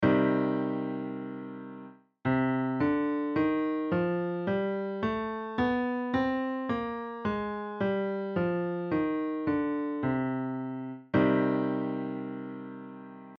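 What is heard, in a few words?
Greensleeves C minor Scale Bass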